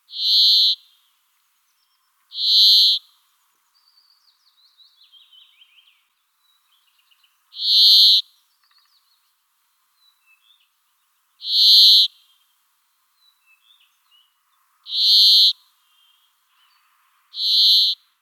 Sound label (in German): Bergfink Fringilla montifringilla